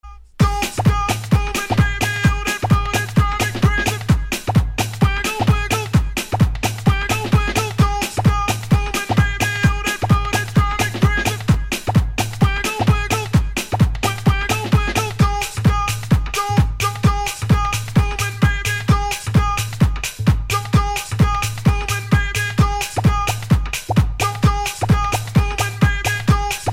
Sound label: dj mix tone ringtone, english; english ringtones